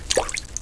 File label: DRIP, Household